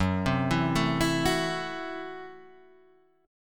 F sharp Minor 7th Flat 5th F sharp Guitar Half Step Down